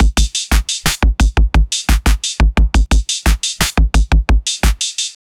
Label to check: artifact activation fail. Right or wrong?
Wrong